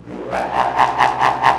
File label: SPIRIT CATCHER